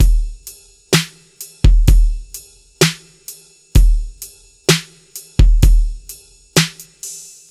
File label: Ballad Beat, Drums